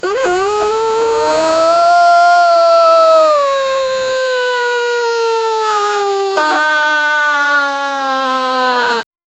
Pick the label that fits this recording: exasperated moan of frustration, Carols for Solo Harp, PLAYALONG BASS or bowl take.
exasperated moan of frustration